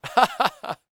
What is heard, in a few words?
LAUGH, Vocal Jazz Fx